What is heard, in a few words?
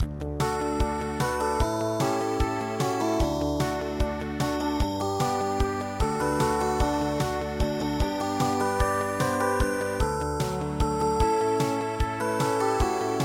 ballade